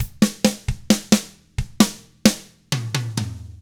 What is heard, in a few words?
SLOW ROCK; 66SLOWROCK; Drums